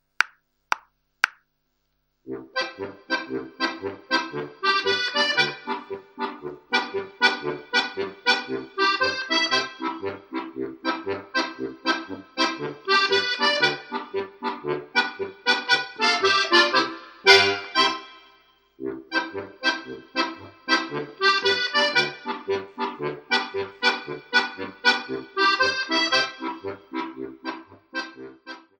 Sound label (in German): Polka (2. Stimme)